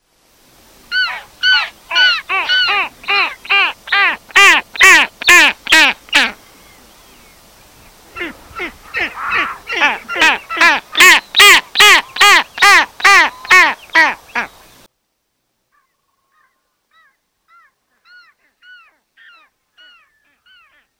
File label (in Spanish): skua chilena; Stercorarius (Catharacta) chilensis